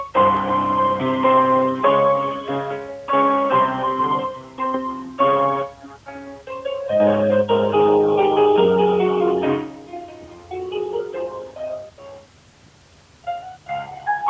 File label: Elevator Music